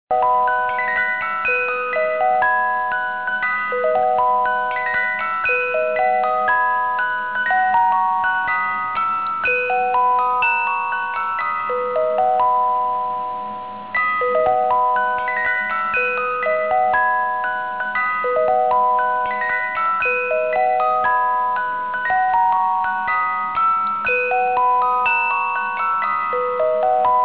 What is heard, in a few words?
Piano Concerto No 5